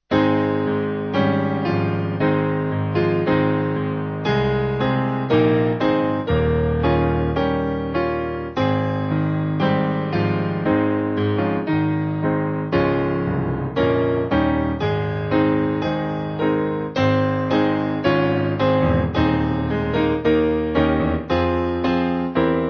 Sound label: Piano, Music Backing Files for Churches